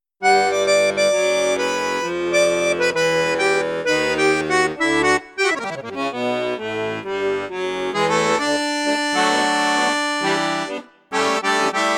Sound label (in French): registre basson flute juste piccolo, accordeon; cavagnolo vedette 10